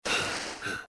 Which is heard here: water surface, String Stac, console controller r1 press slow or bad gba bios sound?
water surface